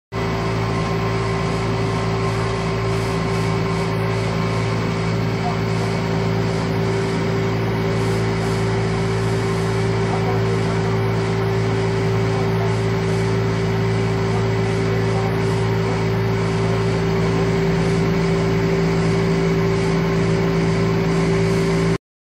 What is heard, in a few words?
Machine Running Ambience